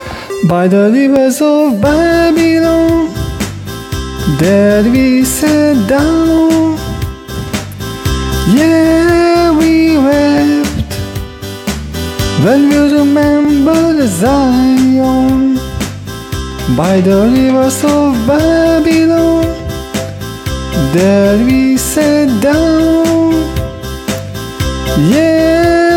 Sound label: music; trading